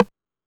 Techno, EMU XL-7, Drum Machines

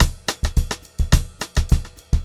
VAR, Funk-Groove Drumloops

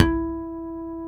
HARMONIX E3

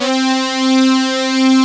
PROPH LD; Pro-Rec - Synthsonic; TRINITY

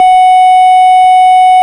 Farfisa Clarinet F#5

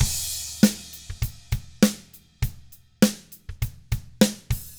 ROCK, Drums

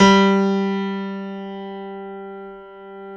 PIA GRDPI, Roland

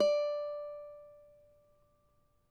CAVAQUINHO, Guitars; Spectrasonics; Hans Zimmer Guitars VOL-2

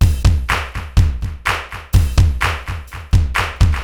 80s delay Akai XE8 (125BPM), cyborg beats; musicradar

